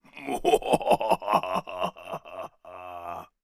Sinister male laughs. Human, Evil Laugh Spooky, Sinister Laugh, Male